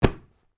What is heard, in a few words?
Ball Catch Sound